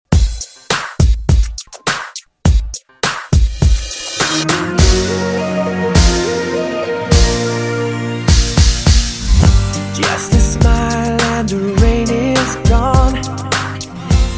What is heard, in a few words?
Classic Re Drum 103 bpm